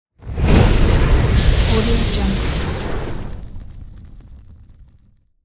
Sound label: fire spell